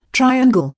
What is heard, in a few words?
triangle